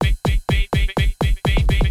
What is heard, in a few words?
drum loop house 125; eJay